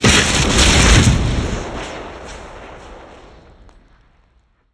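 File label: symbol Exploding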